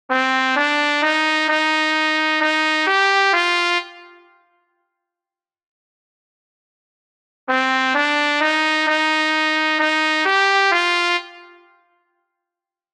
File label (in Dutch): Trompet in Bb